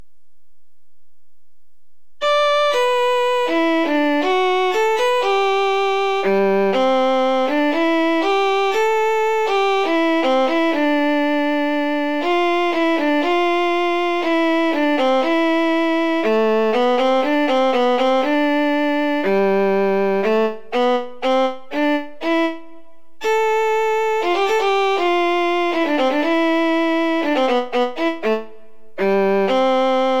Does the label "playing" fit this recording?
Yes